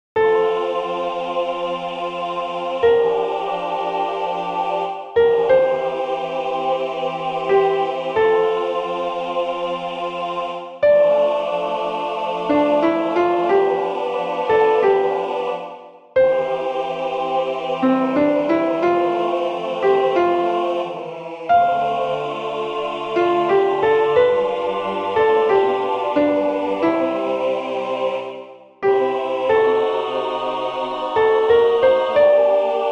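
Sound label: Ave Maria, Soprano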